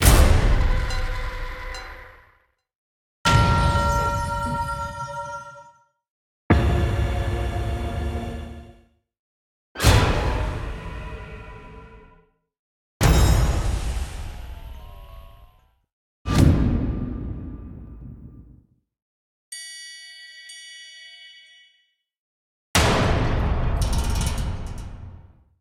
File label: Win Sounds Symbols; Mahi Gaming; Forbidden Throne